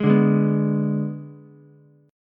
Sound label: B Minor 6th B, Ukulele; Bm6 strummed; JGuitar